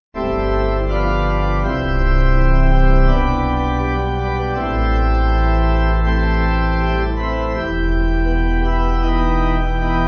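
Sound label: Pipe Organ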